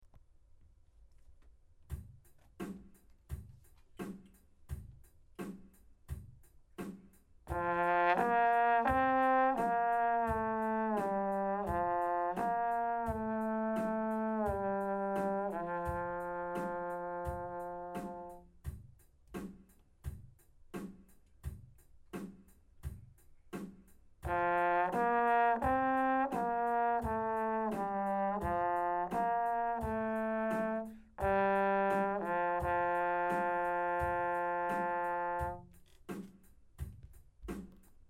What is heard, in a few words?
no tanging